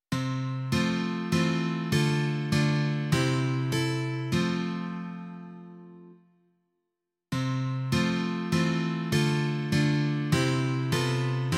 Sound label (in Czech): kytarové duo; Kdo hledá sobě přítele; Imaginativní verze